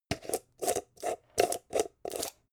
jar plastic midsize open long

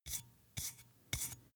glass bottle handstroke sequence slow

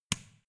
switch small turn off

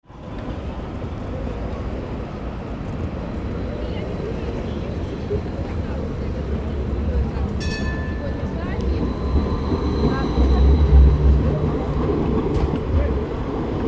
trainstation tram bus

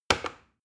plastic button start click heavy fast

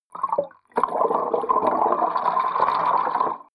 flowing submerged small burbling

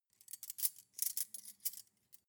cutlery cake forks handle

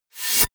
sweep

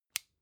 flashlight release

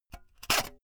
saw use short fast unsteady

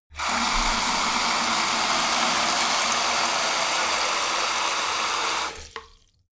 sink fill hard short

